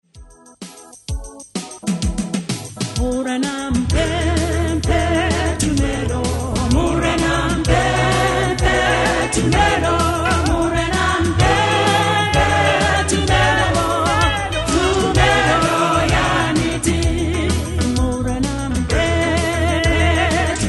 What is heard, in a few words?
Choir; Intl Pentecostal Choir; Tumelo